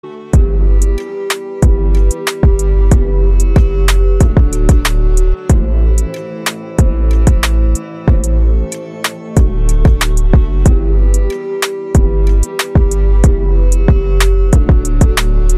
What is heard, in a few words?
TYPE BEAT